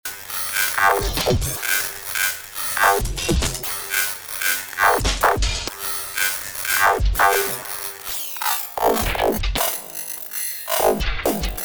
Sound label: formantgran drums